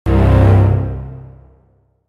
Dark cinematic suspense riser horror

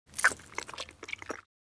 Eating soup light slurping and drinking

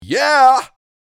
Male yeah reaction

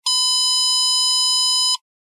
Long tone beep sustained

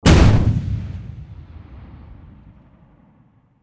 Pirate ship cannon single fire

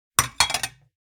Kitchen furniture stacking plates

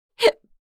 Adult female hiccup

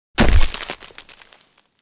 Impact of ice block being smashed

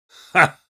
Elderly man laughing

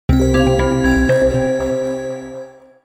Game achievement success; gfxsounds

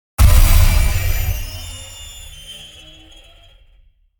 Granular madness impact jumpscare suspense; gfxsounds

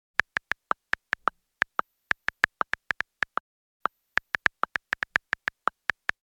Smartphone texting

